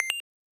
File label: save stats